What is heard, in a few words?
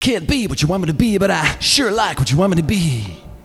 free vocal samples